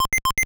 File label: Pause, Sound effect; SML